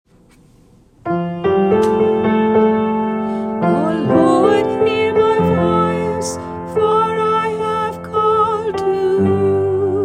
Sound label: Antiphon; 7th Sunday Easter